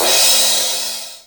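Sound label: cymbal crash; Best Service Gigapack 2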